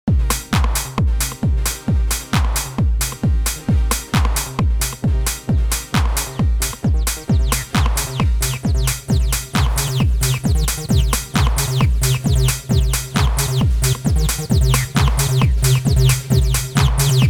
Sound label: MC303 Acid